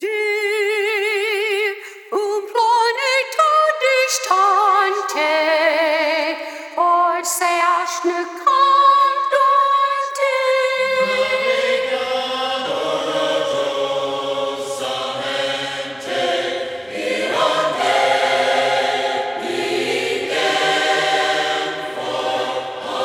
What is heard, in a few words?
Choir Only